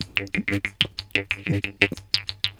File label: KHOMUS